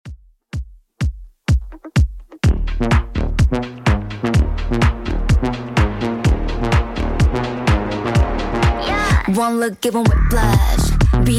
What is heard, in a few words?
Top40 Redrum 126bpm CLEAN